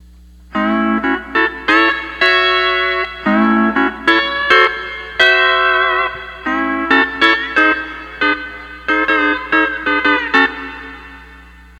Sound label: 12 String Lap